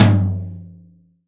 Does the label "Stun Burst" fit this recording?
No